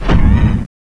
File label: stomp; endboss